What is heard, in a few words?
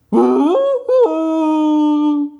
fall; hollow knight gamerip